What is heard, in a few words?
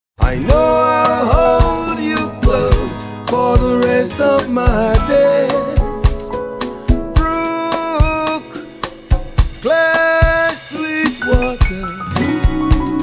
Acoustic Track